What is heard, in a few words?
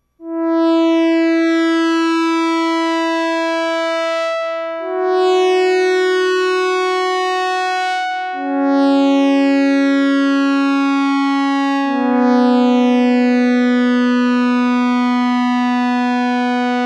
juno 66; blade runner